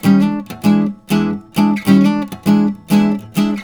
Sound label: RIFFS, Blues Guitar; ILIO; Fretworks